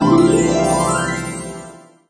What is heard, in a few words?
Sting Perfume; Android